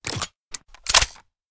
gun charge

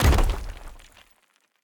ground hit land

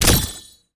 ui equipupgrade

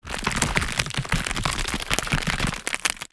stone growing

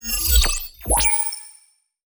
ui match fail

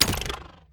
remote switchblade

